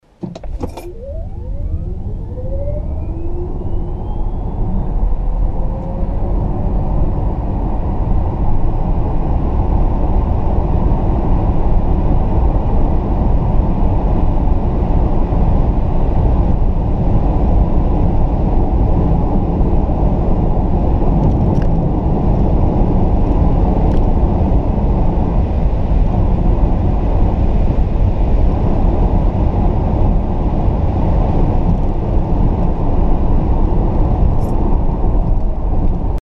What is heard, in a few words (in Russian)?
tesla model s zvuk pri 220